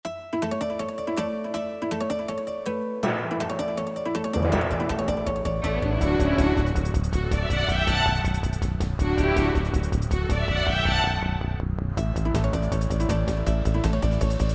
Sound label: When Tympani Attack